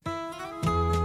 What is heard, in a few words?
One-Way Wind; Cats